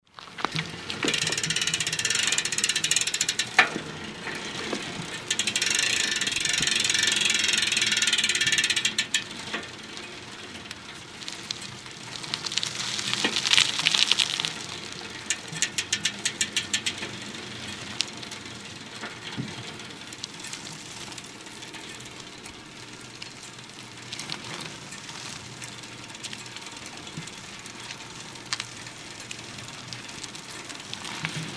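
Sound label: Bicycle Retro Cruiser Outboard Ride Slow; Bicycles (Sound Effects:Vehicles); Big Room Sound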